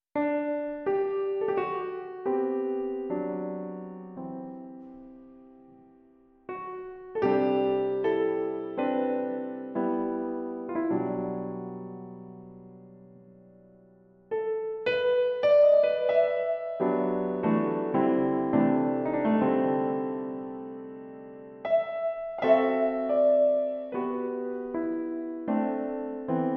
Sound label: piano